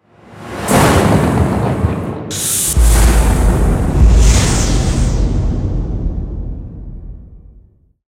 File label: big drum noise heart beat